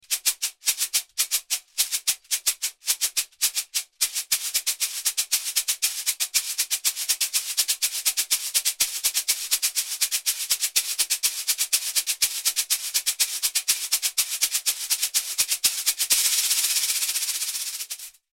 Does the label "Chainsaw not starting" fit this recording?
No